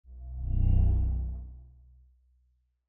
wither beast aura pulse

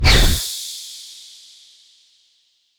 attack; Abigail

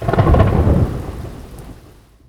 Thunder

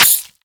NPC Killed

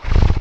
NPC Hit

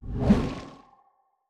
glaive impact ghost

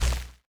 lightning aura zap

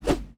monk staff swing

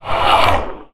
NPC Hit

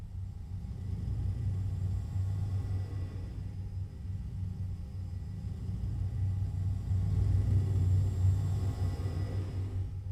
etherian portal idle loop

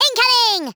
Worms incoming

